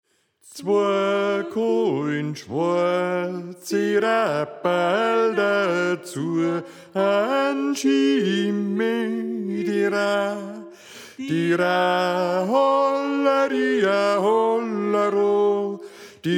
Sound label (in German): Bass; Zwoa Kohlnschwarze Rapperl